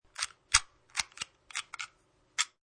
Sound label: Lock